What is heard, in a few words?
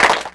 Clapbox Pitch9; Ableton Foundation FreePacks